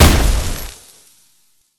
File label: salt hit